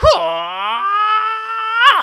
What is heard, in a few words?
New Roar; gamerip; Hollow Knight